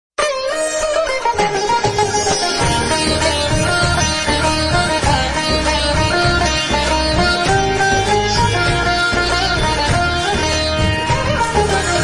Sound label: Diwali Instrumental Music